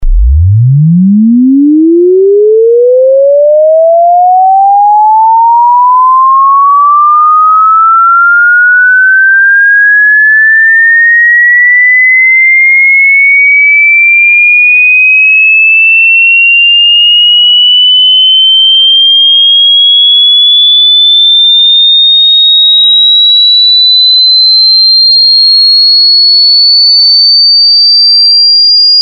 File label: 20hz To 20khz Sweep